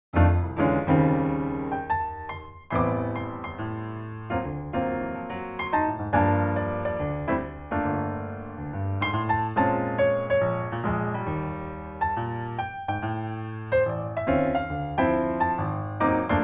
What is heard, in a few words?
Upright Elegant